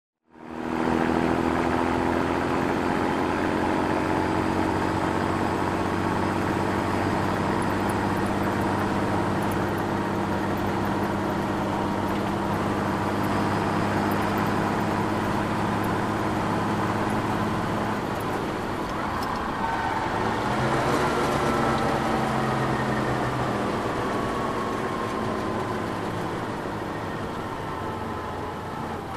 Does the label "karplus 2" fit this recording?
No